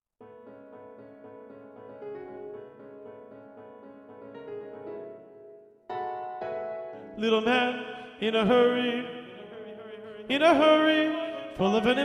TTBB Tenor 2 Predominant; Mens

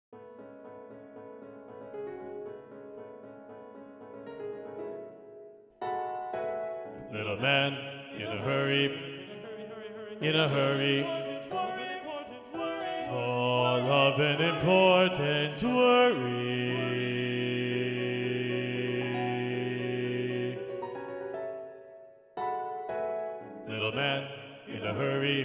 TTBB Bass 2 Predominant; Mens

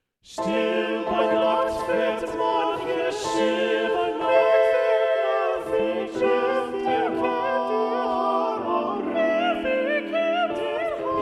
Womens, Balanced Voices; Robert Schumann, ed. MacMullen

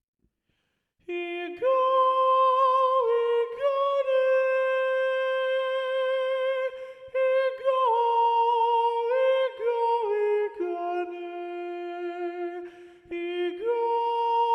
Honour Song 2-Part Treble - Balanced Voices; Mi'kmaq; Womens

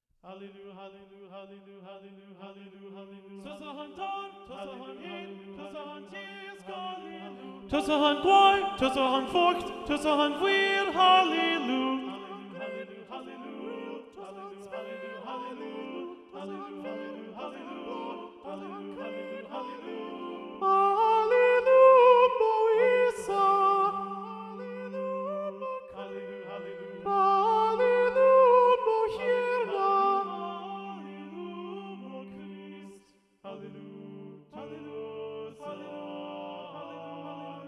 Incantations SATB Soprano 2 Predominant

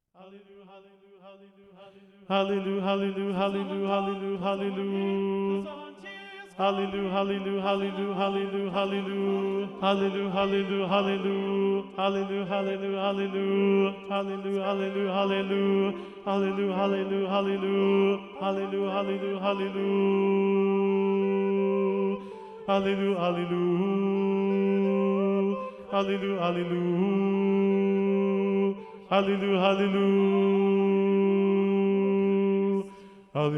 SATB Bass 1 Predominant; Mixed

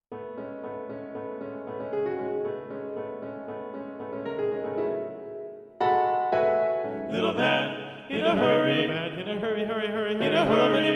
TTBB Balanced Voices; Mens

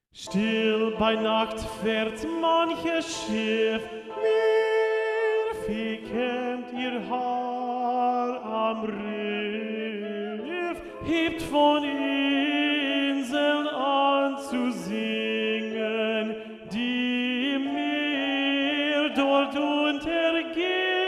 Alto 1 Predominant; Womens; Robert Schumann, ed. MacMullen

